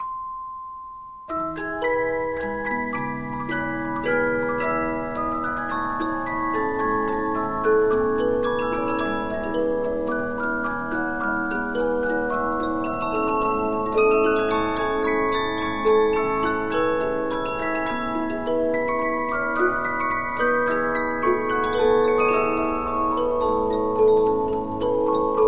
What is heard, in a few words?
Polyphon, 15inch disc musicbox